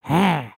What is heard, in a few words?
Idle Bored, creatures organic; Lego; Strombie